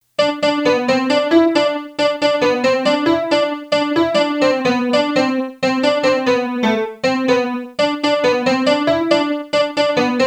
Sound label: melody